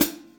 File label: HH PD, Drum 1 Dry; East Collexion